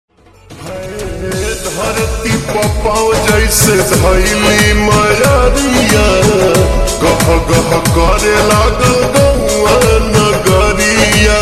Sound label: Slowed Reverb Ringtone